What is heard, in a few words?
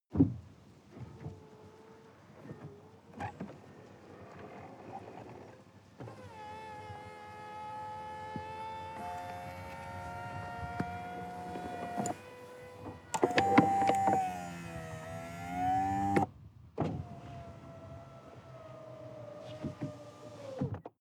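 sports car interior convertible top close; verkehr-transport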